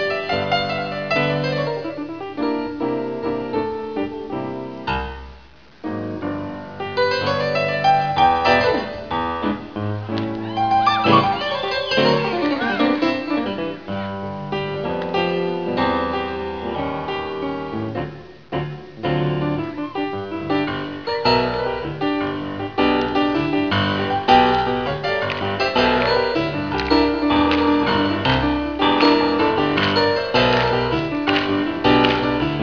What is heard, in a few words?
piano